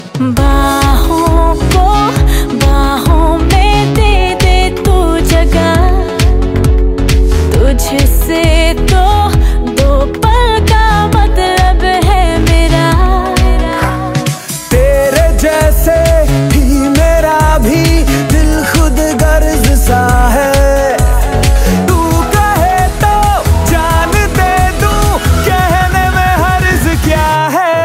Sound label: Best Bollywood Ringtone